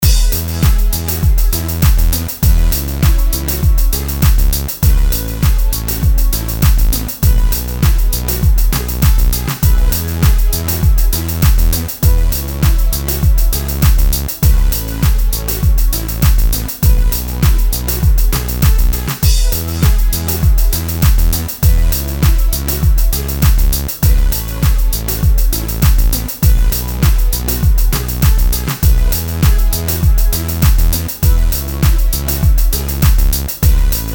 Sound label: merry christmas